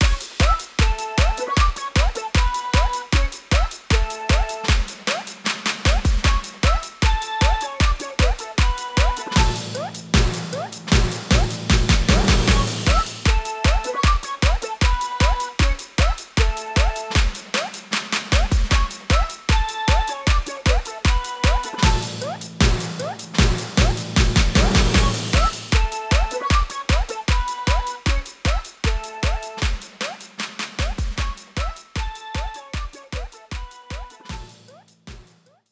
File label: BGM BATTLE RESULT; 100% Pascal Sensei Kanpeki Paint Bombers; 3DS